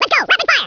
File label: rapid fire, toy soldiers; sc toysoldiers; fgrunt